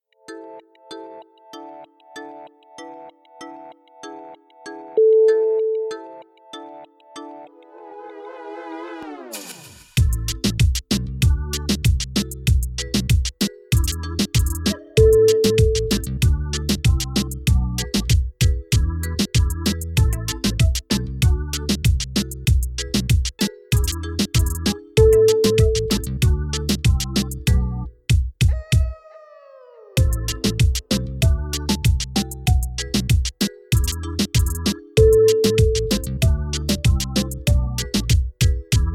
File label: tropical beach dream